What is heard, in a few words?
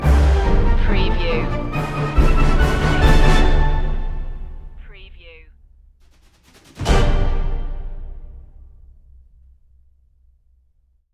underground chase stinger